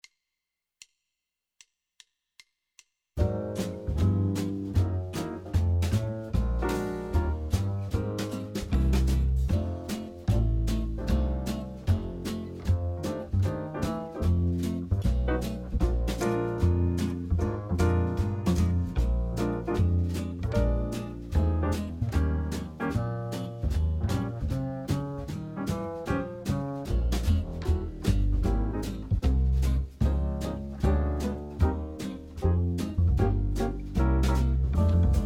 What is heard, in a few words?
horns play along, medium swing, jazz; LMEA All-State Jazz